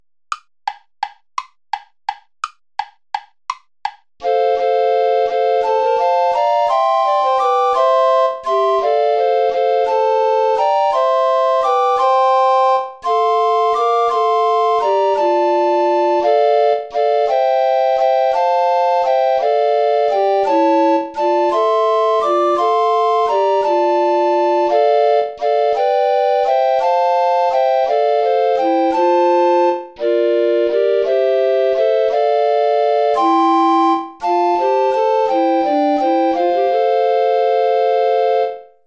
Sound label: play along without bass recorder; praetorius michael dulci jubilo